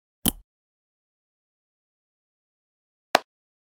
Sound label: musicbox